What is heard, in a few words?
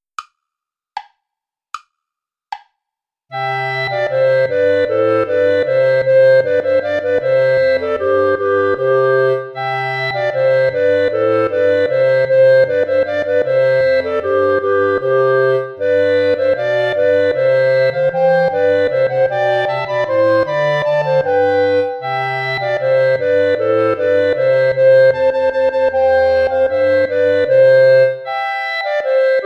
play along without clarinet; deck the halls; traditional